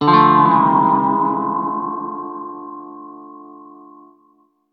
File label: quest sting once